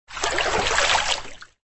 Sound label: Wade